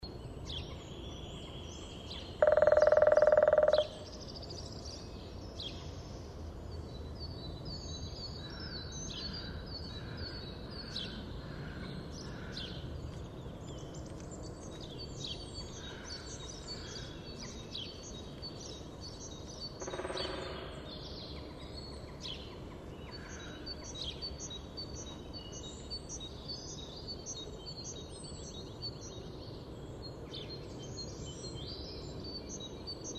Grauspecht; Landshut Hofgarten; Susanne Rieck

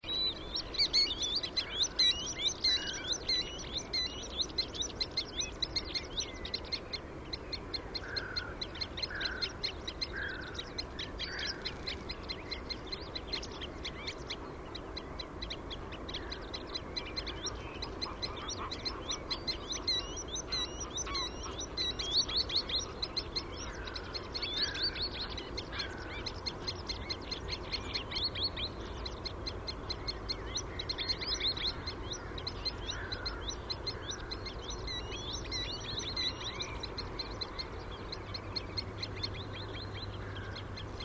Waldwasserlaeufer; Plattling Klaerteiche DEG; Susanne Rieck

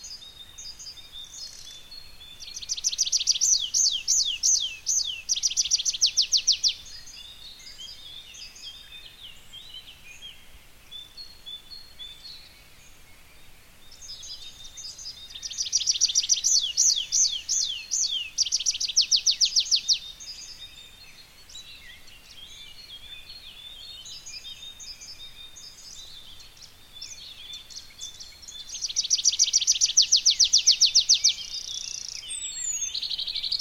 Baumpieper; Heidenheim; Michele Peron

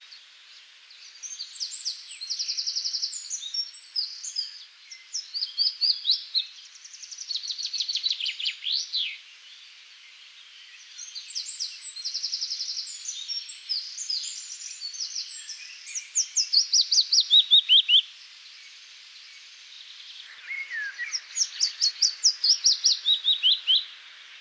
Fitis; Isarmuendung DEG; Michle Peron

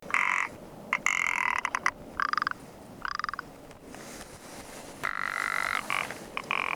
Alpenschneehuhn; Kuchelberg GAP; Ingo Weiss

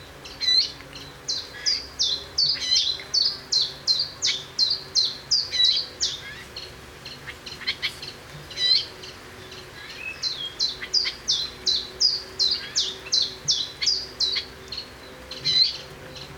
Gartengrasmuecke; Murnau; Michle Peron

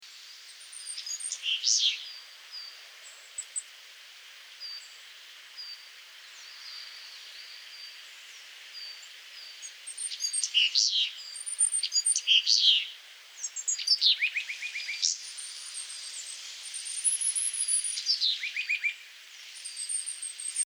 Weidenmeise; Innernzell FRG; Michle Pron

